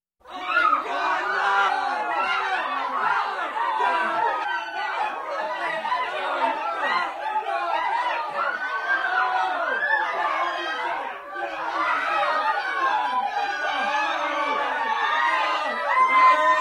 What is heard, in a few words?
Crowd screaming "No"